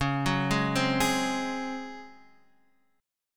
Db Major 7th Suspended 4th Db down Guitar Half Step Down